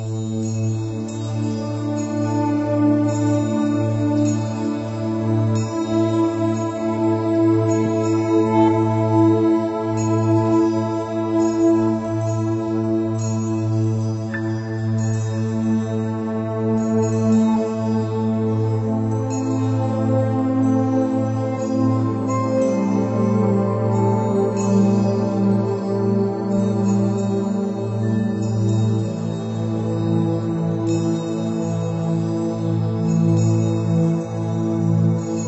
Music Menu; Jewel Match 2; NDS

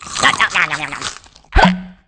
Sprinkleshell Chew; Candy Crush Soda

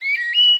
bird random; CS Level3

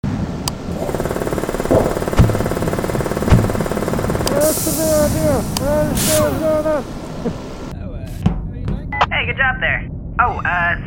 Vacuum; Infiltrating The Airship

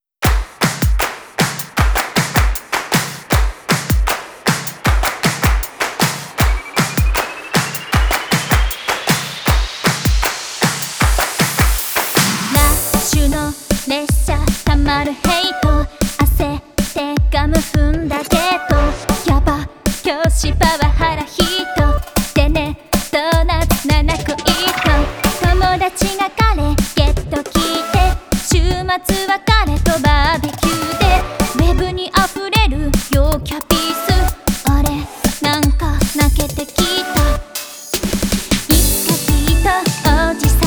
Vocal Solo (JP); AI The Somnium Files Nirvana Initiative Complete Soundtrack

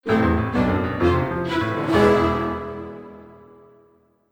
(Jingle) Death; Gnasty Gnorc's Cave

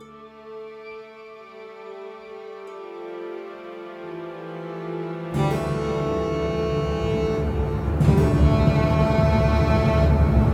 story tense; Shadowhand; macos windows gamerip 2017

